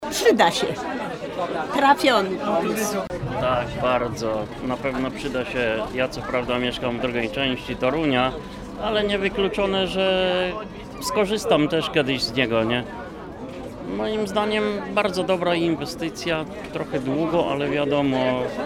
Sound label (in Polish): tramwaj